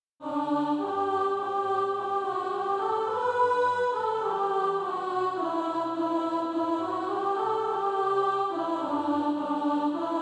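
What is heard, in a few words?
soprano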